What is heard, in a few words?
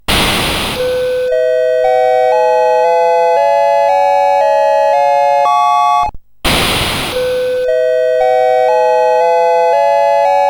BASIC sid CSG8580R5